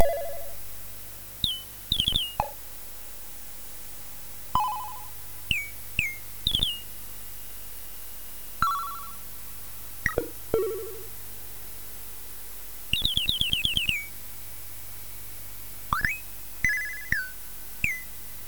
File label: Sound Effects, MOS6581R3